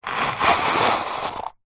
table tennis portable retractable net pull open out plastic housing; zapsplat